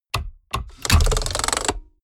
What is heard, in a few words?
mechanics medium rattle